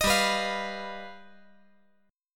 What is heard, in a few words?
G Augmented 7th